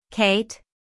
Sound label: cat